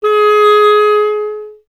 clarinet G#, Solo Instruments; Miroslav Vitous - Solo Instruments VOL-1; 90 s Sample CDs

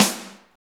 SNR, Tekno Industrial; East West

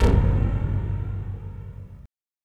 ALIEN FIRES

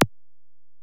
EPerc, Alesis DM-PRO; 300 Drum Machines

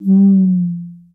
LOW TUMBA, Conga Battery; E-MU Formula 4000 Series Vol. 4; Earth Tones

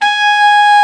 TENOR, Tenor Sax; Tenor Sax 1

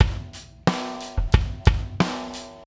Beat, Hip-Hop

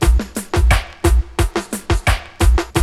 88 BASS, Loops; AMG; Now CD-ROM (Roland)

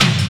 KIK TRSHY, Dance Kicks; Roland L-CD701; Dance K1

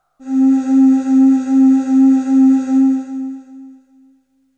Melody oneshot; ZERO G - Datafile DISC 2

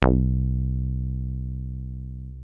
303 C2, TB 303, Synthesizer; Hollywood Edge; Giorgio Moroder Rare Synthesizer Collection

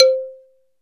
MLT R8 XYL, R8 Xylophone; Roland LCDP11 Africa VOL-1; Bala & Xylo

